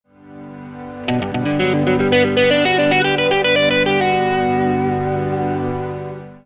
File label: maj7 arp; fretjam